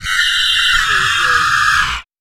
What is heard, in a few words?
Alien screams